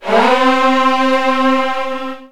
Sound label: C2, Virtuoso Strings; ILIO; Kirk Hunter Virtuoso Strings VOL-2